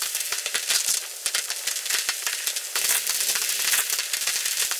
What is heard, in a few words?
Cracklature; sparse soundscape; Loops